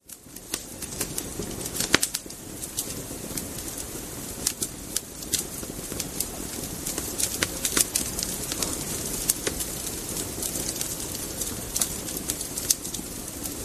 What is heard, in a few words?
campfire, nature